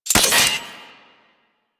Sniper Rifle Alt Mech; Warframe; Weapons; Corpus

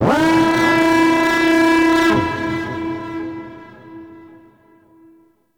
Drop Ship Spawn Alarm; Warframe; Corpus

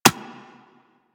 Bullet Impact Generic; Surface

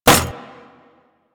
Rolling Drone Landing; Warframe; Grineer

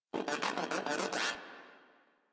Moa Aggressive Vocal Longing; Warframe

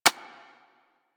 Sentient Brachiolyst Step Default, Steps; Warframe; Sentients

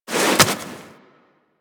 Item Pickup Foley; Warframe; Tenno

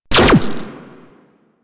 Drone Laser Fire; Super Moa Drone

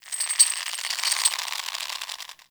Under City Chain Rope Basket Creak

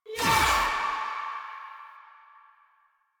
Choir Erupt Proj Throw; Warframe

